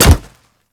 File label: Pet Step Default; Alad V